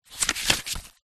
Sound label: household book cub scouts record small page turn; zapsplat